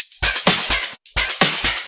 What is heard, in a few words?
Electro Loop 128BPM; Vengeance Samples